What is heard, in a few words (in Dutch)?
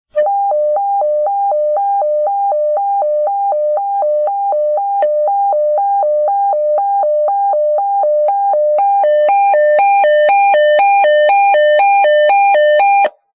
Kazernealarm